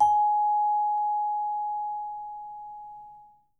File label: G#5, Percussion & Harp; Miroslav Vitous